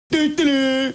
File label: dun duh dun